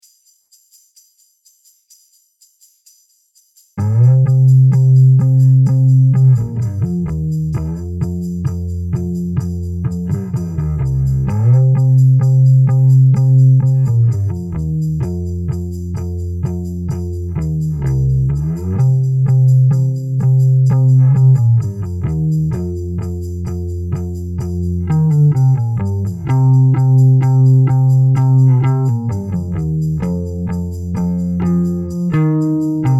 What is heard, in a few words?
Basline 8 notes, 64 bpm